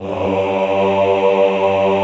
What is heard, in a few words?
AHH G1, Extended Classical Choir; Best Service